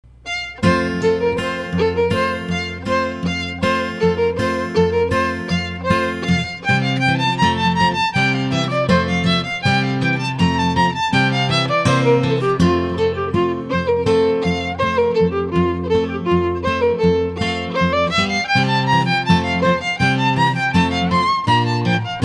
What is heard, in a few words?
violin; brandenburg